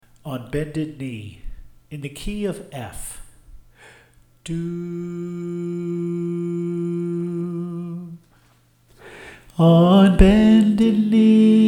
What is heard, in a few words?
Soprano